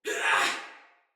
Pain Soldier Female; Battle; Barks; Dialog; Sinecure Soldier VIP Female A

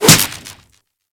Whip Impact Default, Weapons

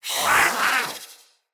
Catbrow Feral Vocal Attack Medium; Enemies

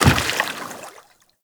Step Water, Footsteps, Walk; Warframe; Characters; Tenno

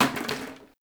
Janitor Plastic Bottle Water Drop

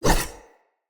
Saw Dagger Whoosh; Weapons; Player; Swords

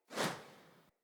Great Sword Whoosh Accent Air Heavy; Weapons; Player; Swords; Mendicant Great Sword

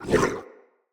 Jump Short, Vocals; Enemy; Characters; Corrupted Sproutfolk

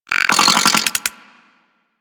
Leech Projectile Bite; Leech Osprey

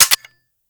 UMP Mech, Weapons

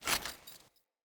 Foley Action Armour Walk; Characters; Mother Armour

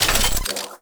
Grineer Shipwreck Rocket Activate; Gameplay; Warframe; Tau

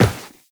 Run Metal, Footsteps; Warframe; Tenno

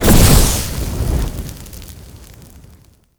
Fire Torch Burst; Ambience; Props; Warframe; Tau; Circles Of Hell

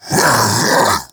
Vocal Pain, Infested; Splitter Split; Grey Strain

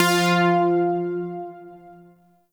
Shawzin Oct Two F; Music; Warframe; Zariman

